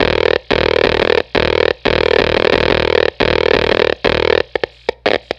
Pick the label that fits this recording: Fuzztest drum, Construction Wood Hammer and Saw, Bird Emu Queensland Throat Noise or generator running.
Fuzztest drum